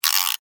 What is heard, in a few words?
Alarm Clock Wind, Technology, Alarm, Clock, Wind, Short, Clicks; Alarms